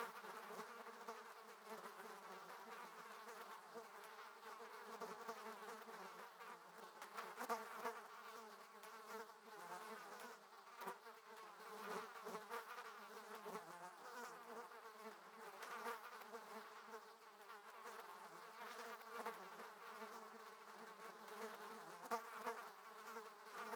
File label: fly swarm; Rust